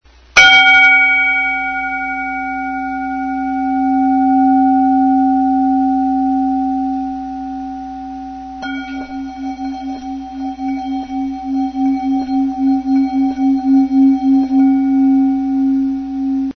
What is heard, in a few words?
bowl